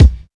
mid-range steel kick drum c sharp key

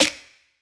short reverb acoustic snare c key

